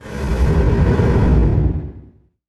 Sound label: IMP FLAME, Cinematic Impact